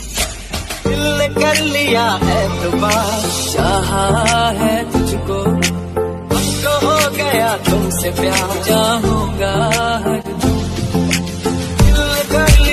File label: dj remix ringtone, bollywood ringtones